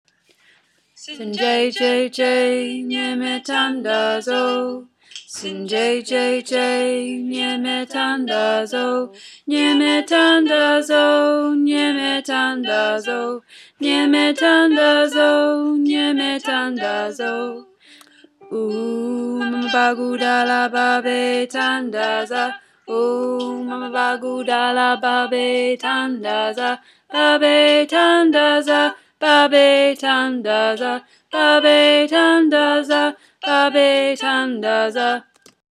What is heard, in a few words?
tenor